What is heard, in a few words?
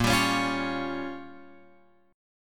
Bb Minor 6th, Guitar, Open F; strummed; JGuitar